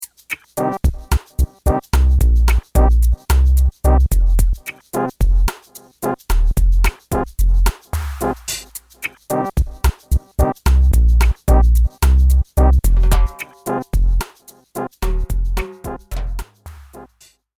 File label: Dub Trombones; gforce software